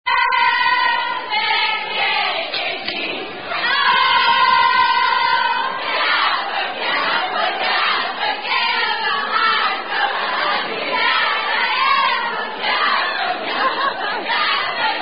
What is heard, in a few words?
Sorority Song; Oh Pat Says She Sorority Song (Tune Irish Washer Woman)